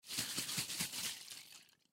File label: Hairspray Can Shake Hard; Primping; Foley